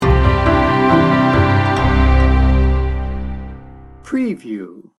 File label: drifting sting bumper